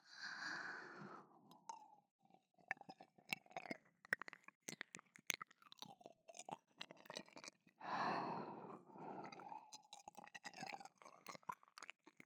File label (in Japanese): 左耳舐め, ASMR